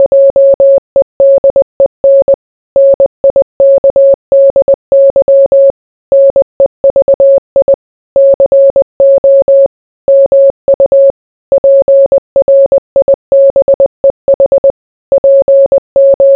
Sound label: morse